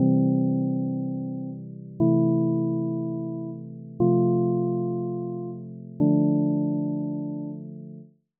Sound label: lyra harmony